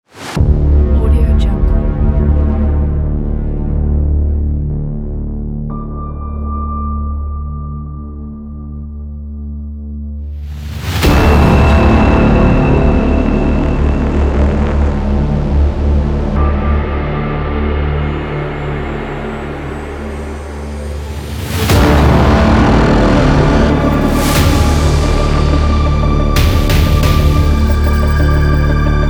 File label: horror trailer; tastyaudio